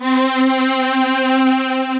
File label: Vox Humana, Synth Pad; Ableton; Factory Packs; Synth Essentials